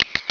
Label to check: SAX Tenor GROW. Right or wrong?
Wrong